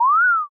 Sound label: refresh